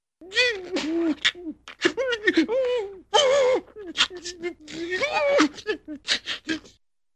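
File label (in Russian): плач и жалоба с бормотанием; Звуки сумасшедшего; идиотские звуки, которые издают психи в фильмах страшилках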